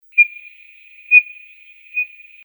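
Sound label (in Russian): Podorlik malyy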